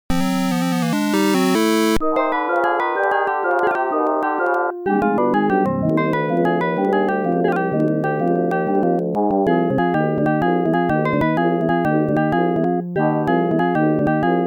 ~Bataille~ (Encounter ~ Battle Food Empty ~ Victory)